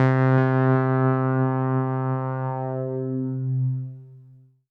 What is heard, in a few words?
Pad C, Synth Prophet One Shots; Electronisounds